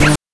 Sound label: BANK2 SFX, NES DPCM; MIDINES